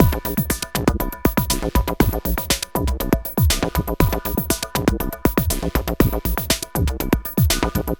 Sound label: Synthetix, Processed Percussion